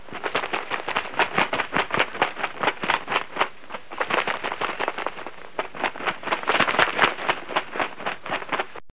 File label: flappin